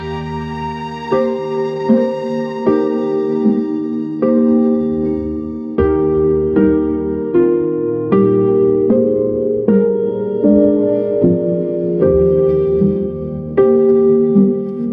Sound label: orchestral strings; ai